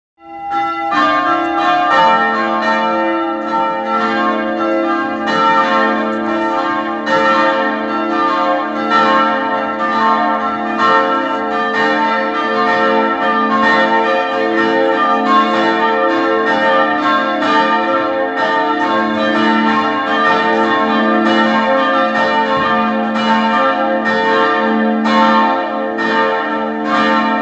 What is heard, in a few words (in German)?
Glocke